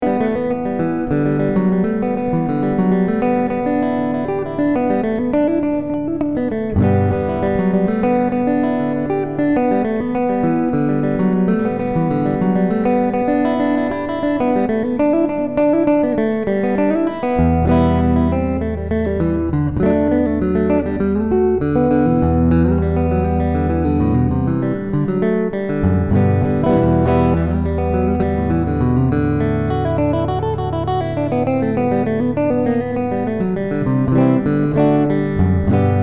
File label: folk flatpick on the electroCoustic